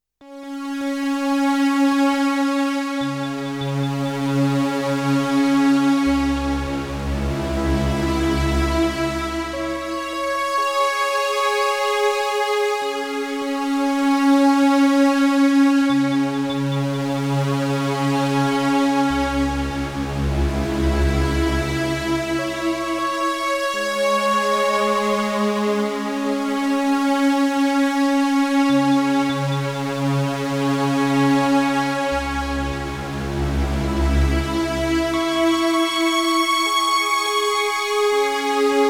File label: Roland JDXA Octaves